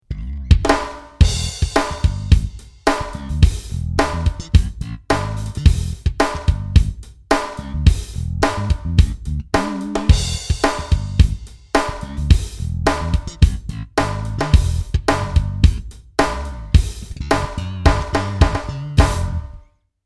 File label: bass and drums; xp-80; roland; Multi-patch demo; roland demo cd-3